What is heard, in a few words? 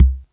Bass Drum Round Hi; Lost Tapes From Mars